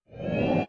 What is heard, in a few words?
zoom in; Inception The App